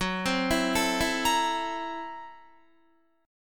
Gb 7th Sharp 9th Flat 5th Gb Guitar; arpeggiated; Gb7#9b5; 14,15,14,14,16,17; JGuitar

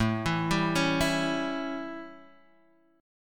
A 7th Guitar Drop D, chord

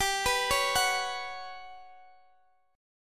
G Major 7th Flat 5th, Guitar, Open G; down

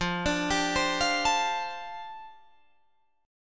F 6th Add 9th F down Guitar Drop D, chord

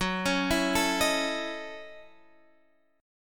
F sharp Minor 13th, Guitar, Half Step Down

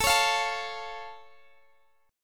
A Augmented A, Guitar Half Step Down

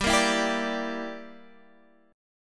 Bb 6th Flat 5th G

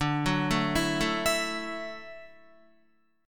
D-Major Add 9th-D-down-Guitar; arpeggiated; JGuitar

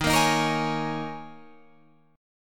D sharp 7th Suspended 4th, Guitar, Open F; D#7sus4; strummed

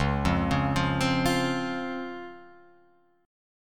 Db Major 7th Db Guitar Open F; 1,0,1,0,3,0; DbM7 arpeggiated; JGuitar

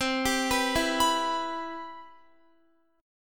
C Major 7th, Guitar

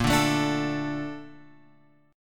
A sharp Major 7th; A#M7 Chord {6 5 7 7 X 5} strummed

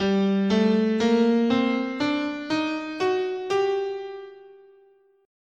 G Harmonic Minor, scale

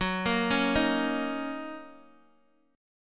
Gb 6th Gb down Guitar Open D

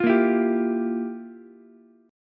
F Major 7th Suspended 2nd Suspended 4th, Ukulele; strummed; JGuitar

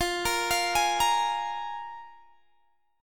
F Minor Double Flat 5th, Guitar, Open G

